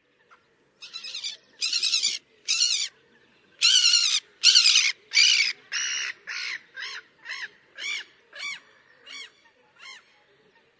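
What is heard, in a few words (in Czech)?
racek chechtavy, black-headed gull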